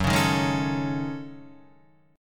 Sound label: F sharp Minor 6th Add 9th